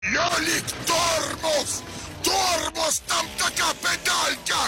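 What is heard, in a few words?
tormoz, brake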